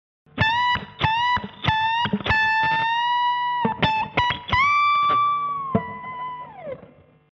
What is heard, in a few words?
lick